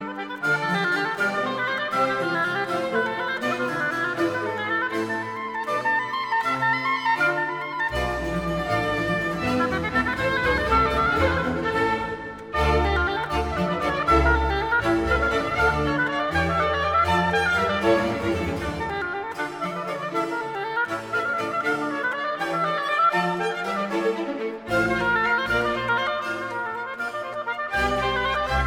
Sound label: concerto d minor for oboe strings and basso continuo presto; bwv 1059r